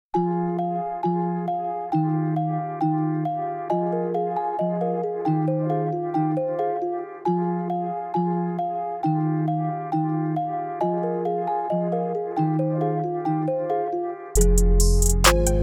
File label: beat